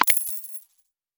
Screwdriver Screw

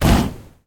Jump

